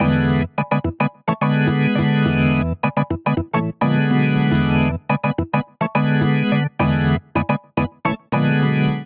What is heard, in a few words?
Vamp Funk Organ E7 106 bpm; Electric Keyboards; Ableton; Factory Packs